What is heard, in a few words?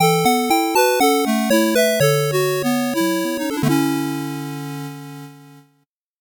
8bit jingle fast